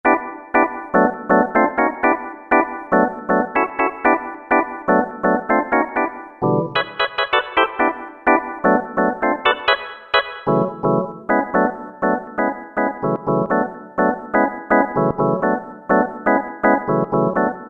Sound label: Organ Hits, Roland SR-JV80-06 Dance